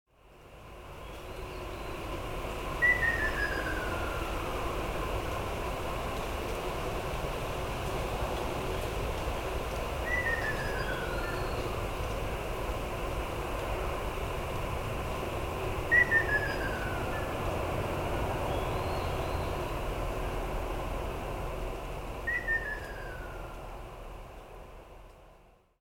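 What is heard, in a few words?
Ruddy Kingfisher; akashobin; miyako; koe; uemura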